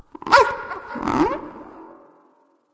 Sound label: defeat; bastet